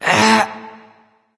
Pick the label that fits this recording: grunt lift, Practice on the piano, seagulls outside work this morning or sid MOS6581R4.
grunt lift